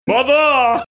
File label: singing mother